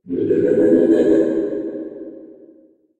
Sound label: crab; Barotrauma; Barotraumatic; Icecrab